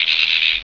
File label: sphere pain; hl1 npc